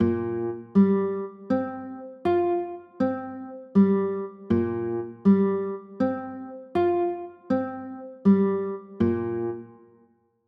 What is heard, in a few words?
b flat 6 9, chords